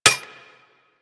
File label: Bullet Impact Generic Metal; Surface